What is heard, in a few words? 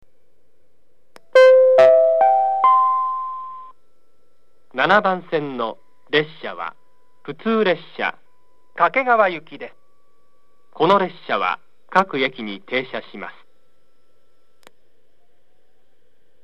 toyohashi local kakegawa; station; jr-central